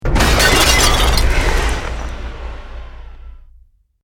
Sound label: EXPLOSION MEDIUM BRICK WOOD GLASS NO FIRE